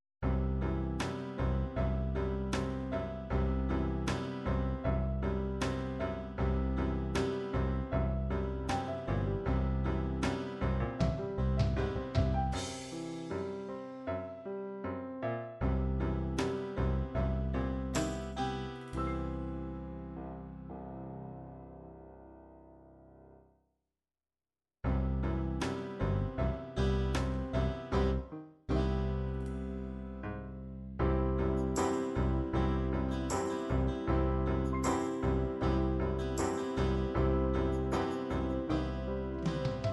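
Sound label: ballads ballad piano ballad, midi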